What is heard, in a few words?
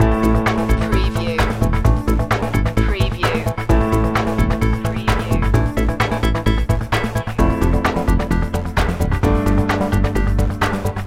work in progress loop